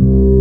SITAR C2; Big Fish Audio; Synth City

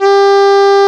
EX ST G3, ANA STR

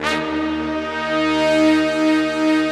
big brass, Slow Big Brass

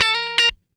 Guitar Licks

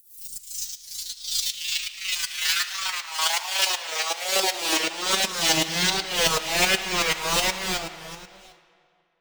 Noise, Processed Noise

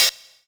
Hats, Elektron MachineDrum SPS1 MKII

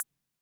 Perc, rhythm ace; classic machines

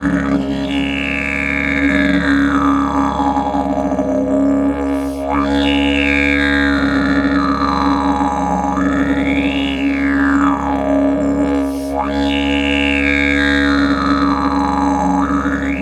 C# LONG DRONE, Didgeridoo; Big Fish Audio - Didgeridoo and Other Primitive Instruments

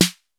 R8 ELECTRONIK; Rubber Chicken Software Sample Bank; DRUMS + PERC

